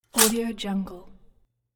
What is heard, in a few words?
toaster sound